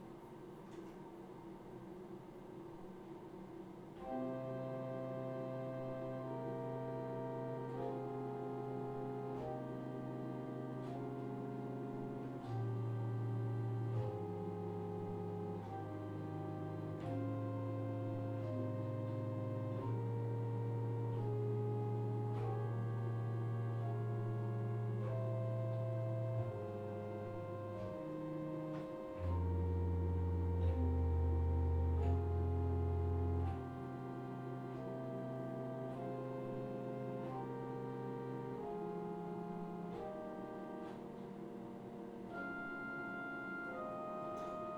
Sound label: adagio, orgels; Lheebroek; travers